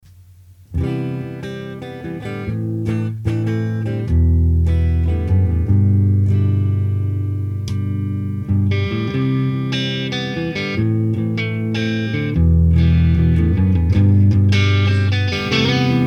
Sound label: Brit Chan Mild; circuit demos